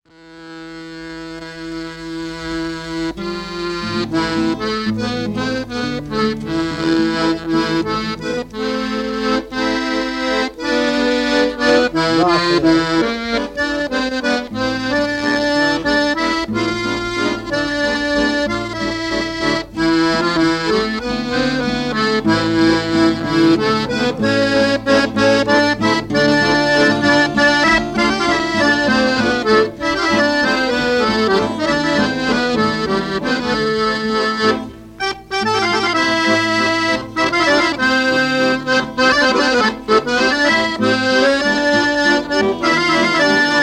valzer chitarra e fisarmonica; santagata

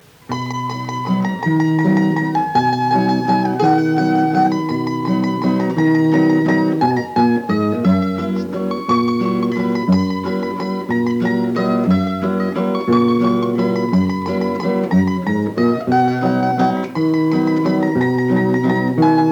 valzer Mandolino e chitarra

